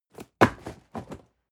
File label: cardboard box medium fall high hard; objekte